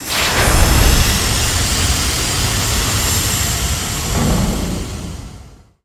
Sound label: Door Large Metal Open; Doors